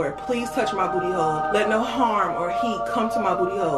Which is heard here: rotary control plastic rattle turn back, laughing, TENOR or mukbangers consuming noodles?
mukbangers consuming noodles